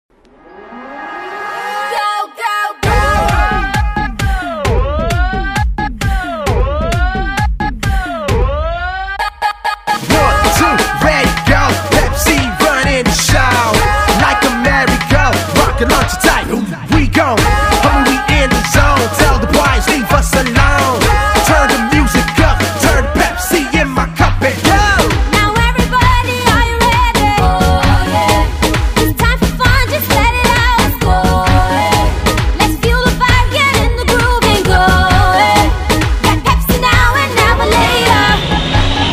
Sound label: Theme Music; Pepsi Euro 2012